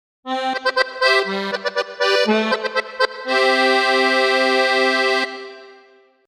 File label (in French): impro accordeon; loto sonore